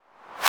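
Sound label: 909 reverse claps; 300 Drum Machines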